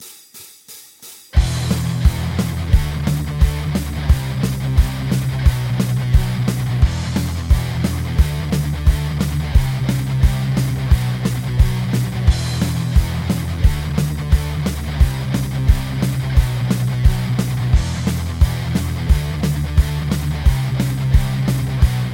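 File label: Backing Track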